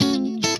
FUNK N CHUNK, FUNK GUITAR; Greytsounds

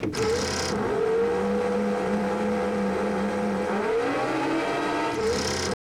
SERVO; Total Control - Action Cutz

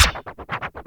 WAVEDRUM; Back In Time Records; Big Bang

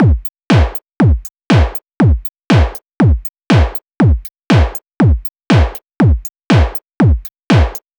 Beat, Drums, Eclectic Electronica

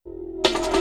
LOOP INTR, Drum & Bass, 160 BPM; Ueberschall; Drum & Bass Resonance

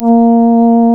REED A#; Big Fish Audio; Synclav FM

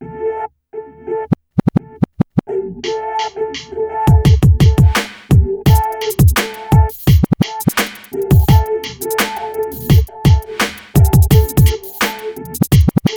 85 MIX, Hip Hop R n B; AKAI; USB Soundscan vol.19

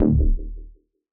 Bass D#1; radiosonics; Policebox Bass

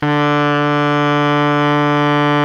BARI MF D 2, Sax; Giga Samples Collection; BARI 3-WAY

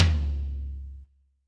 tom, drums; Yamaha SY85

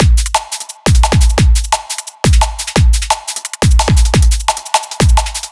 Drum Loops, 174; AquaLounge; M8; Electronisounds

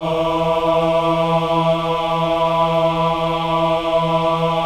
VIB E3, VIBRATO, Voices; Spectrasonics

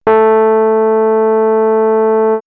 Rhodes; X-Static Goldmine; backtothe70's

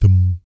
BASS THUM; Spectrasonics; Vocal Planet; Jazz FX

